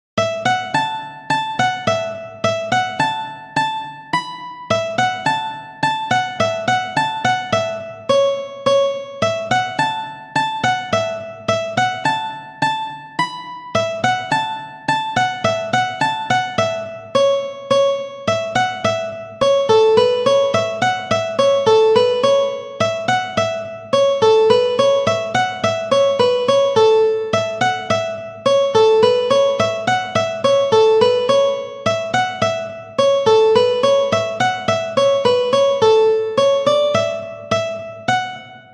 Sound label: Melody 100bpm, mandolin